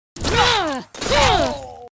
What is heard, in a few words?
Witch Claw; Goddess of Trampling